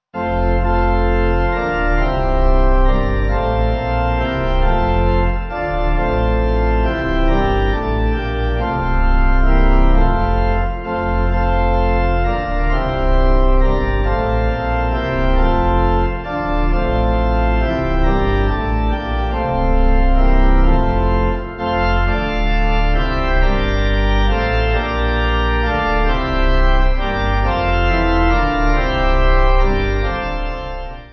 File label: Pipe; Allein Gott